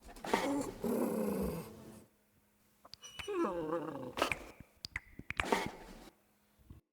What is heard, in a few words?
Wolf Cub Idle; Vocals; Animals